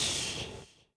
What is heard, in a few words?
steam vent hiss end; Star Wars Obi Wan; XBOX